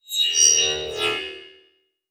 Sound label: Multimedia Internet CD-Rom Flash Electronic